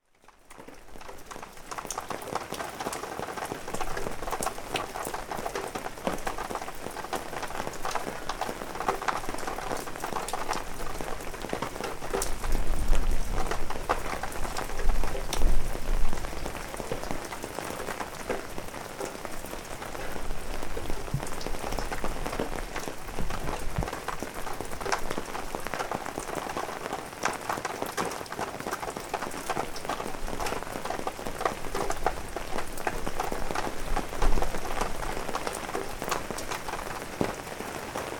son de la semaine pluie

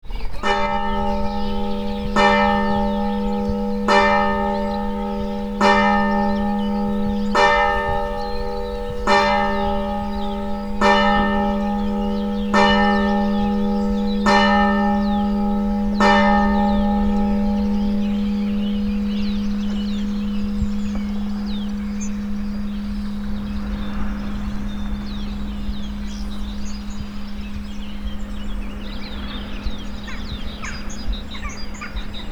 la Cassagne, L’église et les oiseaux.; Point d’écoute : la Cassagne

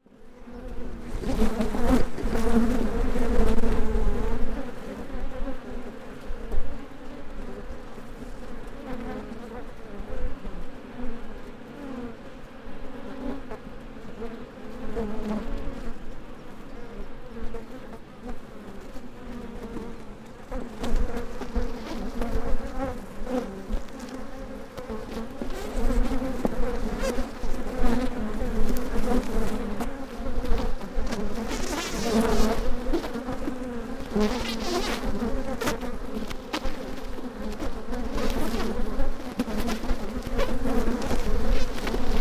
Ruche, Les abeilles n’aiment pas du tout la paire de micros posée sur la planche d’envol de leur ruche; Abeilles en colère; La Bachellerie

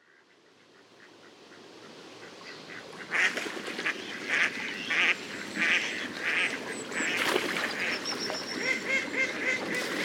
canard colvert

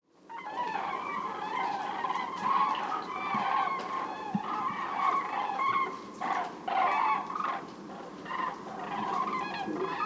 chant des grues

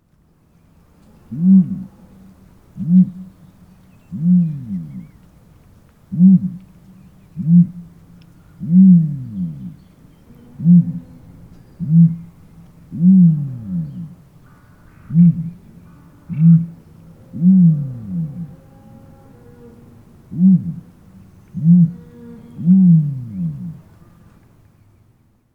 autruche; Rencontre fugace avec une autruche mâle.

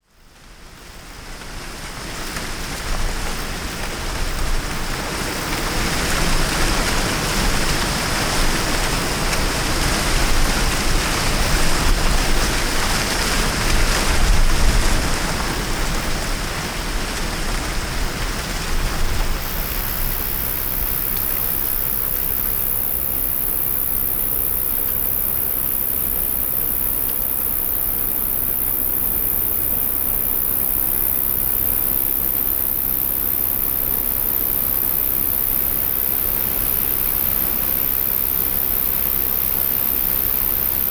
vent cerfs; stpierre

